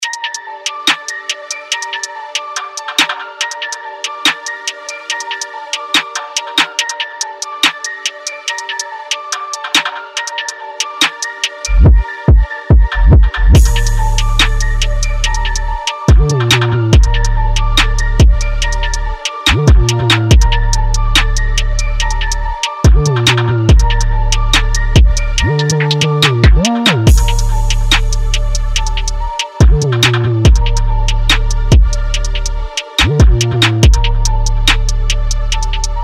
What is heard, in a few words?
Drill Waves